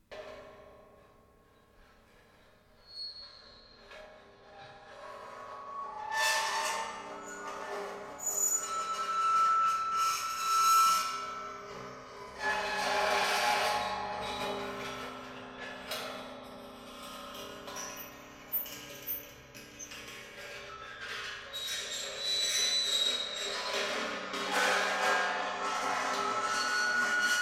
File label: Screeches